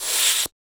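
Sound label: vox percussion, Vocal Planet Jazz FX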